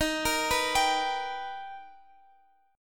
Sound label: B Augmented Major 7th D sharp, chord